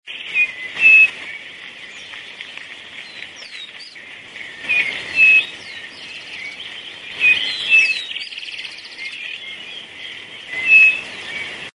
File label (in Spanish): voz crespín